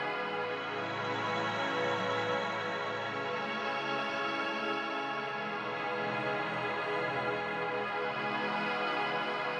Pad Layer

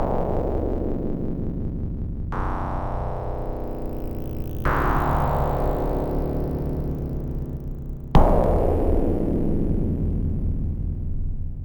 Game Clear; Vanslug X Mission; IBM PC AT

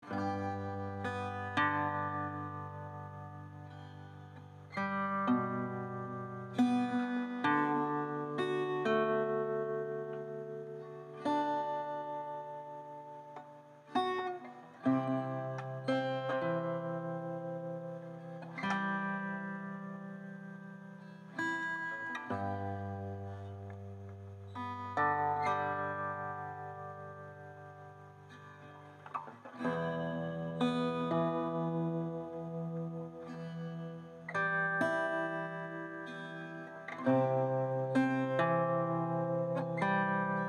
Neutral loop

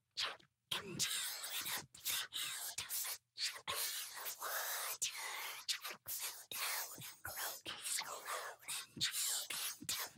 song whispered; Devour 2021 gamerip pc macos

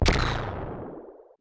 chargeup fire iterated; Gonner

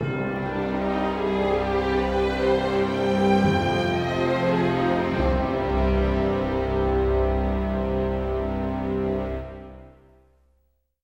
Death Music; Spider-Man 2; PSP

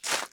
Footstep gravel

